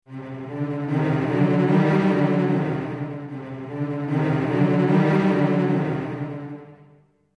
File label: cello trem